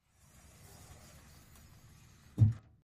Closet Door, Metallic Sliding Along Rail And Close